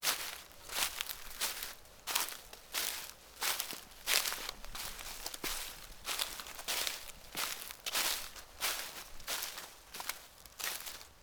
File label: corn field stubble walking